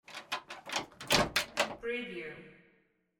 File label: door open